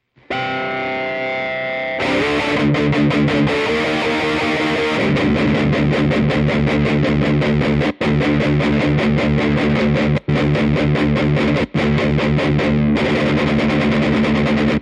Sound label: Me50 switch delay